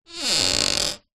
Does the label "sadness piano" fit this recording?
No